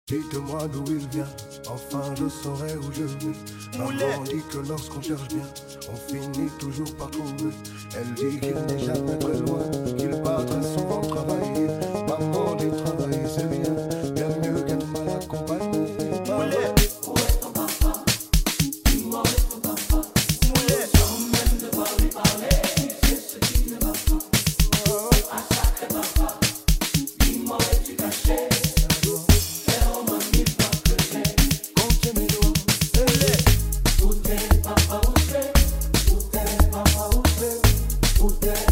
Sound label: Amapiano Version